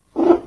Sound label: raar